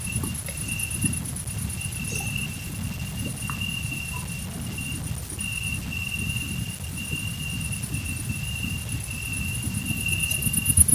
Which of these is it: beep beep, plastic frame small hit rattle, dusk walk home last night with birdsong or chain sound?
beep beep